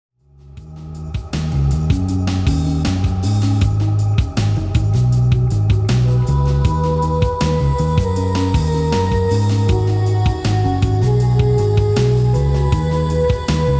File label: trance dance